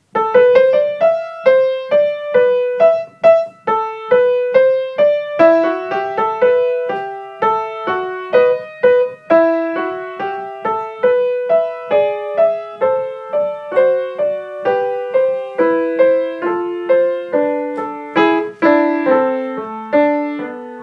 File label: Fugue; online concert